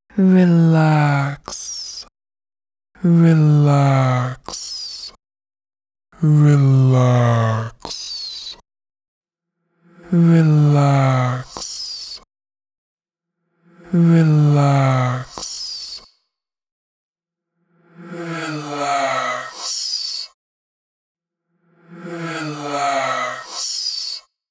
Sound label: Relax Effects